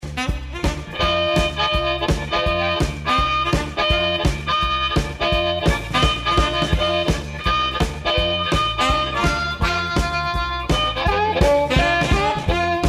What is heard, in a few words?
Octave Slap, harmonica